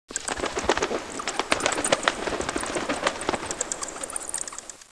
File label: Bird; 特酷声音素材库